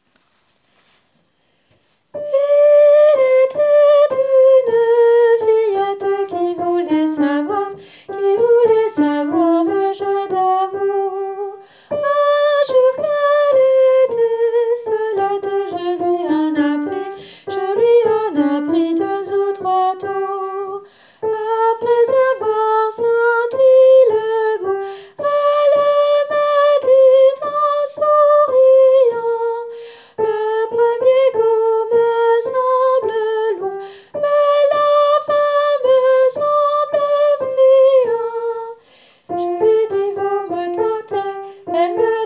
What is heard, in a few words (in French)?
Fillette ténor